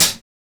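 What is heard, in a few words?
OHH, open hi-hat; E-Lab; X Static Goldmine 1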